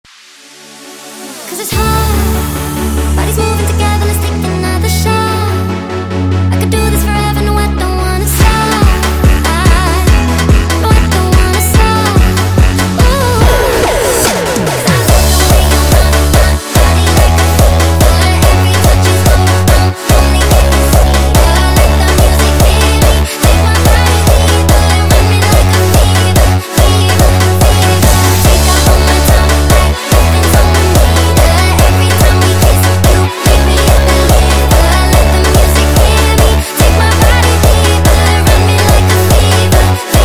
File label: Jumpstyle sped Up